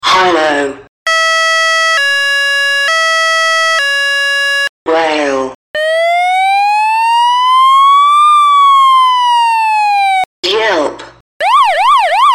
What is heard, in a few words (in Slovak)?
sirena elektronicka 12v hilo; fiamm ps10 cz am sound